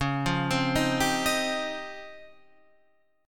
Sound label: C sharp Major Sharp 11th, Guitar Open F; C#M#11; arpeggiated; tuning=Open F; guitar chord